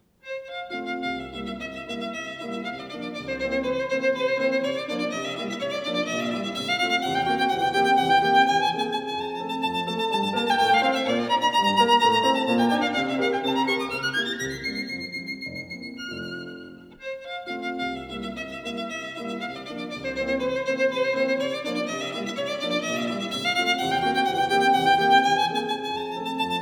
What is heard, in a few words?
Sonata for violin & piano No． 9 in A major, Variation 2; Beethoven; ＂Kreutzer＂